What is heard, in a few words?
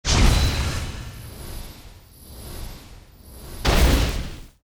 spear skill